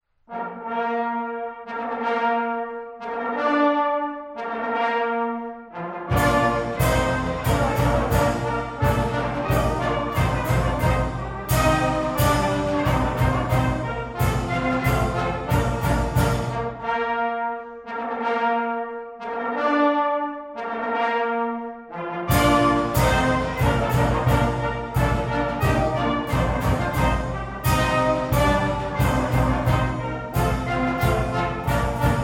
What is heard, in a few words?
Cavalry Quickstep